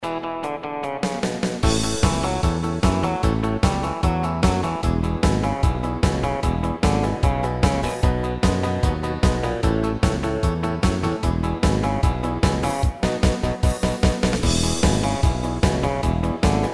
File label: drummy rock